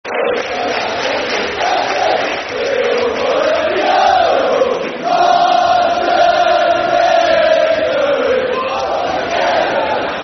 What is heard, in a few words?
We All Follow The Yeovil, Football Chant; Yeovil Town Ytfc; fanchants